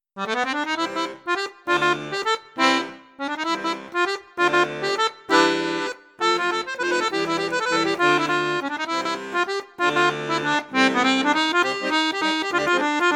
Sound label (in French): registre flute juste, accordeon; accordiola